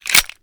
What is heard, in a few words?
reload fast empty xmag stock mag in; gmod; pi mike